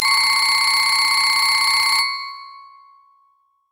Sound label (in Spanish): Timbre clasico, tonos de llamada